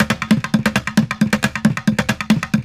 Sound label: Street Groove, Ambient Grooves